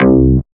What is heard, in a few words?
BASS MONO